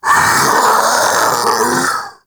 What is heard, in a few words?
Vocal Death, Infested; Splitter Split; Grey Strain